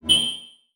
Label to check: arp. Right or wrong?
Wrong